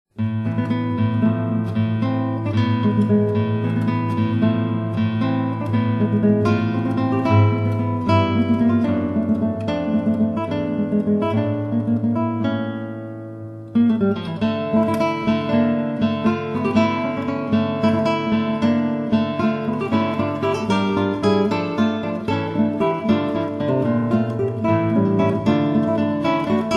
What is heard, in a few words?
3 Guitars